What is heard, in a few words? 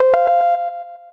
new mail notification